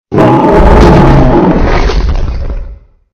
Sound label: Hippo; Princess & Conquest v0.20.06